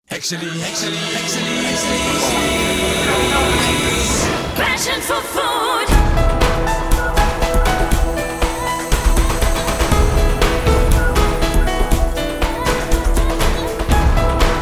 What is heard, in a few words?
jingle short